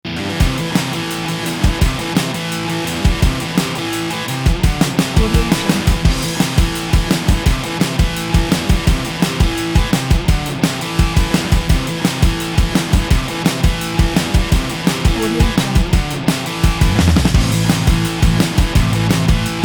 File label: extreme sports rock